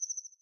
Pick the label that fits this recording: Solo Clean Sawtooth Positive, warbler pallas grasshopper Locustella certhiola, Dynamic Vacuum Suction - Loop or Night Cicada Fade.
Night Cicada Fade